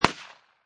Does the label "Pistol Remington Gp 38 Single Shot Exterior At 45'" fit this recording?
Yes